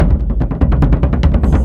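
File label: Music Shield; From Dust; PS3; Xbox 360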